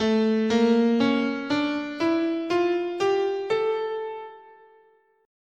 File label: A Phrygian Dominant, scale